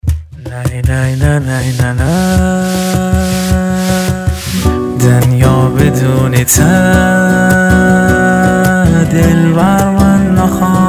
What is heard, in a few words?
mazandarani ba gitar remix